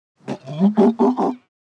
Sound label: Sea lion grunt